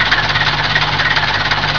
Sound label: ENGINOLD, MACHINES